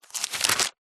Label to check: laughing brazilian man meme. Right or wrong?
Wrong